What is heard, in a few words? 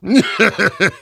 laugh evil; Heavy